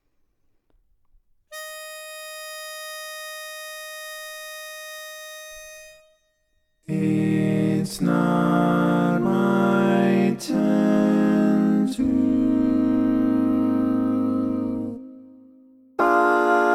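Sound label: barbershop tags, baritone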